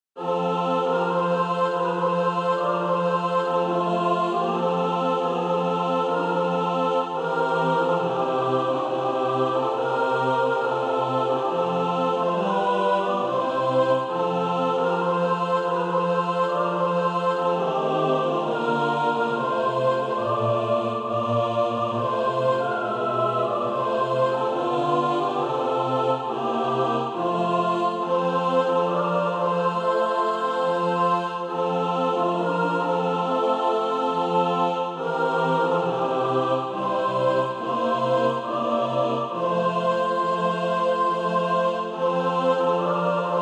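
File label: mixed, SATB Practise Music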